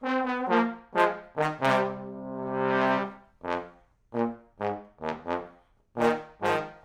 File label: trombones